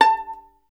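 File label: STR violin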